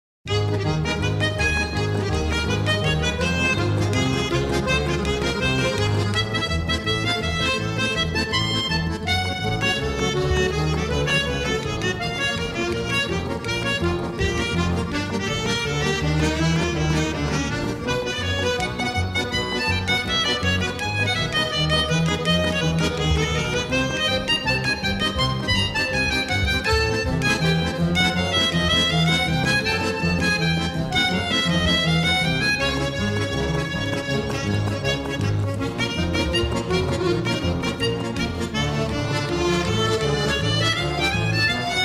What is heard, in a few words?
accordeon